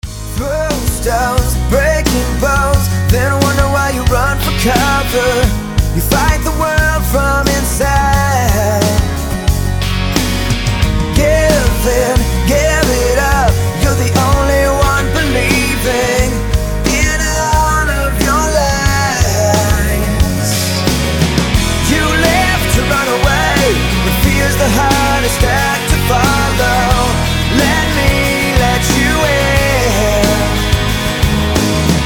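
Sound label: ringtone melodic rock ballad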